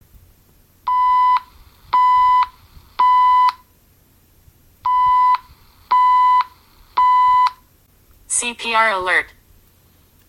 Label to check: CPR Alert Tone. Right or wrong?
Right